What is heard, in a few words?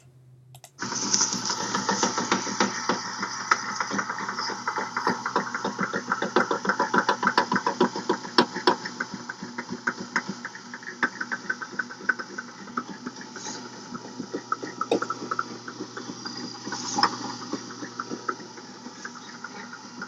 Jojirushi BBC x20 bread machine; noise diagnosis